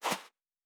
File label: Footstep Sand Walking